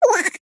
cartoon laugh mouse high pitched

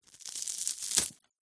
food cereal wheat biscuit snap break; zapsplat

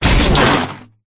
impacts heavy hard wood metal glass crash

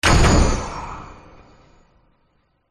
cinematic hit hard slam metallic jingle; sound design; zapsplat

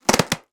hand rub against inflated thin plastic bag; foley

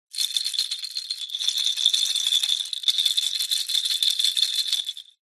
leisure toys baby rattle plastic shake; zapsplat

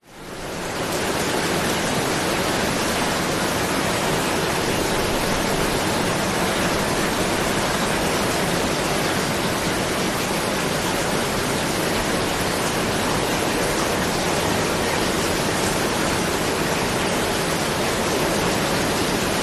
nature rain constant drizzle heavy drips roof

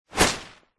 foley tree leaves branch whoosh impact designed

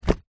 household book very small set down or drop; zapsplat

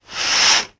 household curtains pulled; sound effects one

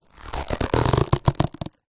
latex glove pull stretch squeak; foley

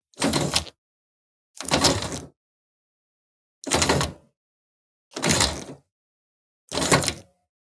Levers Sliders; soundbits

